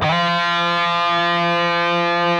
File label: PRS F#, Guitars, LEAD